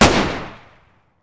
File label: m9 fire, weapons pistol beretta92fs; garrysmod